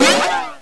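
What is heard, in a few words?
missile launch; THEXDER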